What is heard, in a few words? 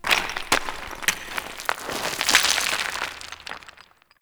Large Rock Crumble I; Ambience; Warframe; Grineer